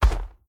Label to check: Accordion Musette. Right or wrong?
Wrong